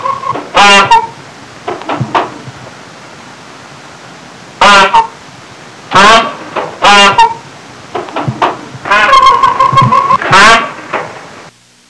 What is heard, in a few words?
peacock; Sound Effects for Multimedia - Volume 1; birds